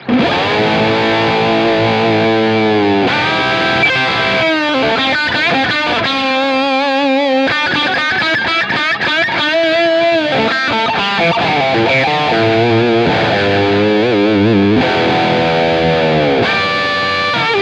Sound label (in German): Paul Reed Smith Lead div. PU Kombinationen; Thomann 70th